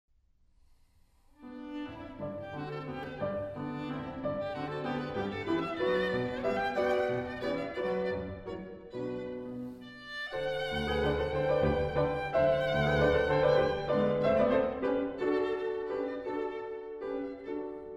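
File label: violin sonata; franck r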